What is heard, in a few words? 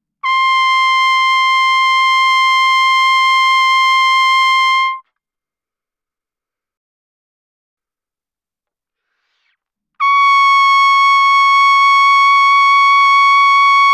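Trumpet vibrato ff C6 D6; Brass; Bbtrumpet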